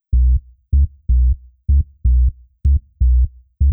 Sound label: Bass Loop B